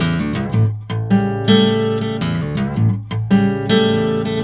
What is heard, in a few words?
BARI RIFF, Blues Guitar; ILIO; Fretworks; 108 BARI RIFF